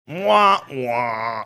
wah waah

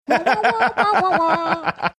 singing string of wah wahs

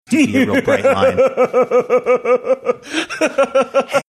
having a good laugh

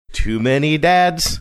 singing too many dads

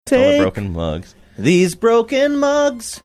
singing take these broken mugs

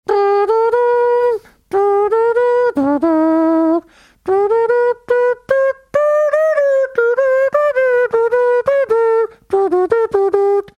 mouth trumpeting theme; transformers cartoon

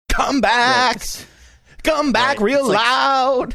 singing come back come back real loud

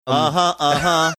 singing uh huh uh huh uh huh

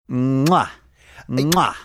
mwah mwah

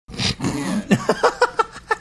snorting laughing

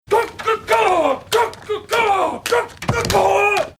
aggressive chicken sounds; gob bluth

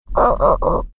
making a cute sound

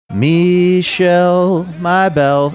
singing

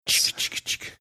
making own sound effect

